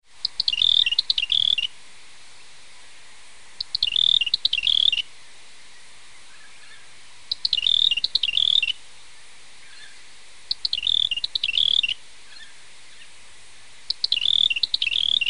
robin red-capped, bird calls

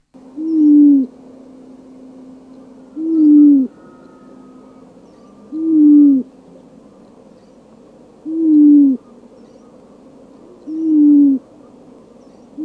bronzewing common, bird calls

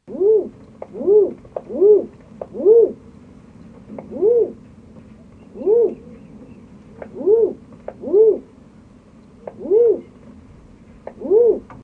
pigeon crested, bird calls

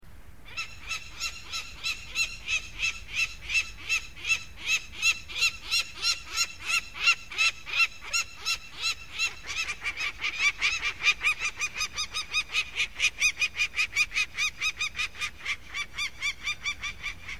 falcon peregrine, bird calls

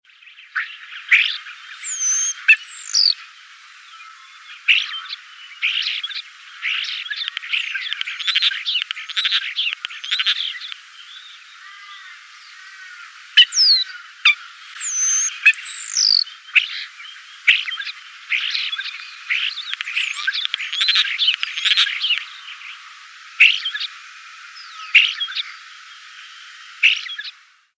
starling common, bird calls

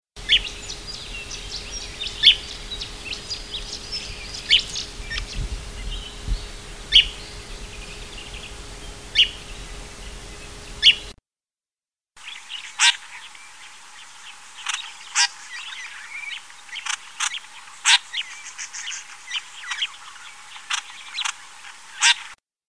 woodswallow white-browed, bird calls

